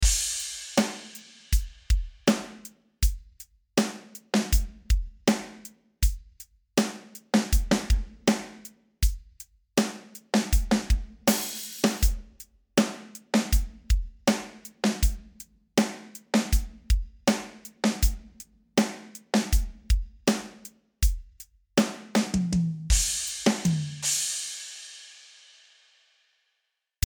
drum

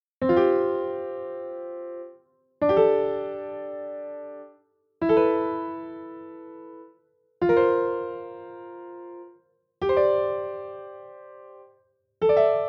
chord name sus4 sound

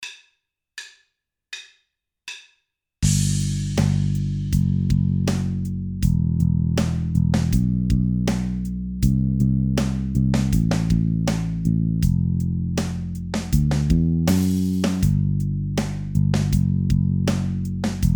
Amelo Bass

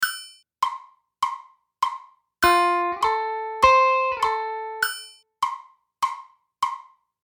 slur guitar

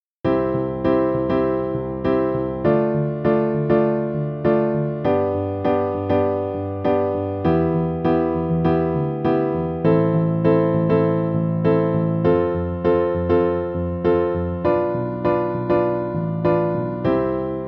chord progress

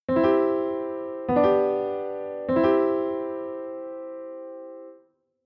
chord progress c g c

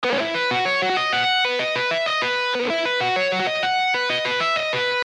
guitar velocity

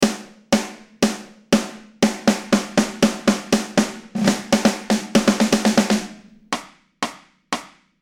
snare

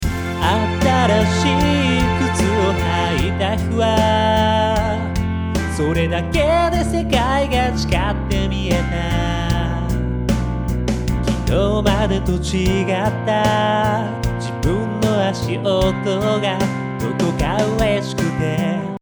reverb

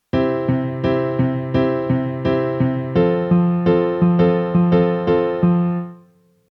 passing normal

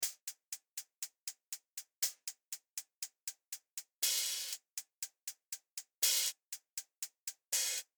hihat

